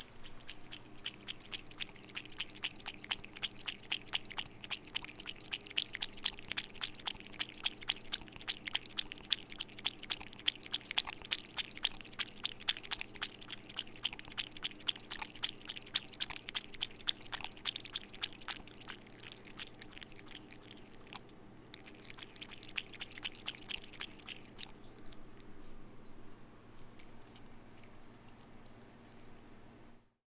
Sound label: Kitty Drinking